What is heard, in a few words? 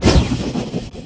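laser explosion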